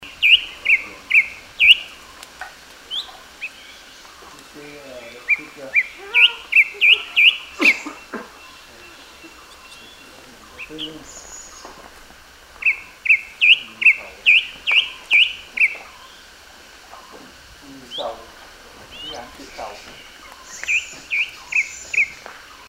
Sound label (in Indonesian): suara asli cucak hijau